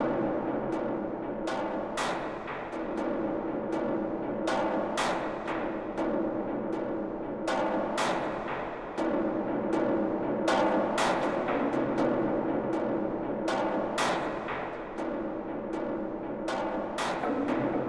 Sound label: MATRIX OF LEADERSHIP PERC LIGHT, soundtrack